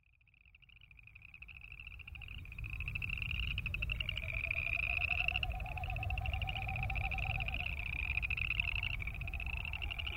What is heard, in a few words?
Boreal frog, yell